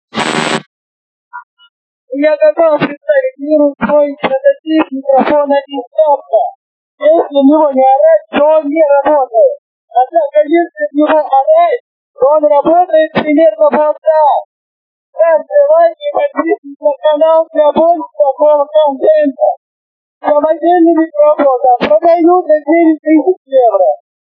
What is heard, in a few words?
microphone